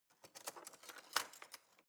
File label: knife wood drawer grab soft